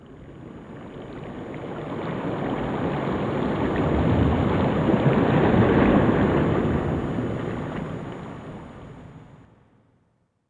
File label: Water Turbulence; GameAudioProgramming